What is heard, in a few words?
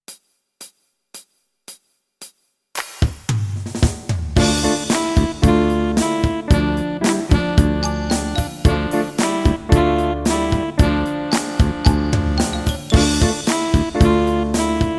F Sharp Jam Blues Bb, Brass